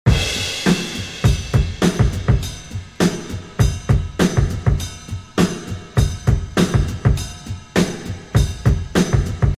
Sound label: rock music